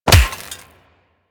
Melee Fist Impact Robotic Flesh

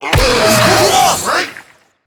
Infested Lich Drillbit Pain

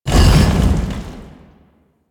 Roll; Warframe; Warframes; Qorvex

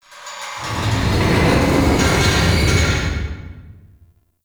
Town Large Moving Object, Ambience; Warframe; Duviri

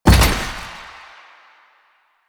Collision Fast, Motorcycle; Motorcycle Ninety Nine

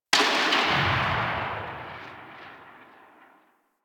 Tail, Weapons; Warframe

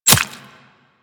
Player Arrow Impact Flesh; Warframe; Weapons

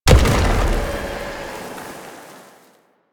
Octopede Unearth Proj By Explosion; Warframe; Man In The Wall

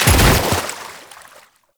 Body Fall Light Water, Foley; Enemies; Warframe; Tau Dax

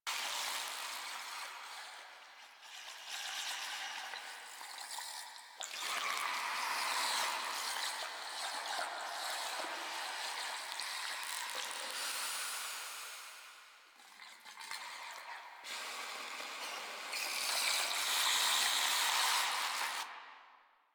Lair Chatter Loop Large

